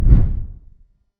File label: shoosh